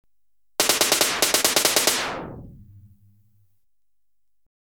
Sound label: Compressed Gas Gun (Single Burst), Electronically Generated Sounds; BBC Sound Effects Library